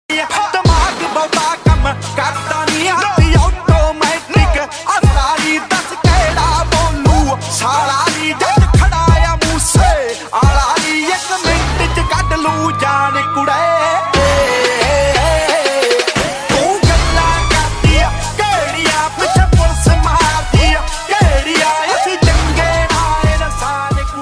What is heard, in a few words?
punjabi, ringtone